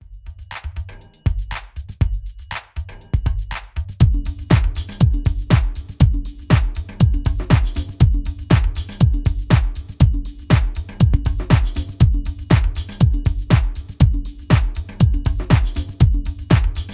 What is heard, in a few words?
Dance Off; It's Fred; iOS gamerip 2012